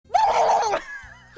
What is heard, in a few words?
gobble; Madame Panic